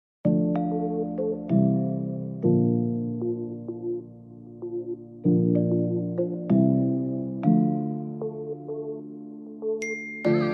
Sad Emotional Afrobeat Instrumental Dancehall Afro Soul Type Beat